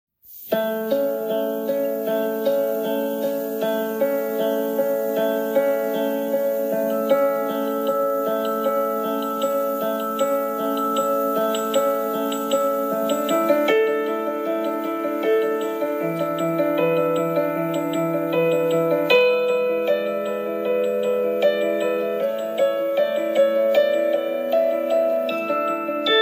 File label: Early Riser Alarm